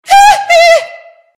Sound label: hee hee